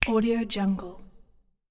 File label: finger snap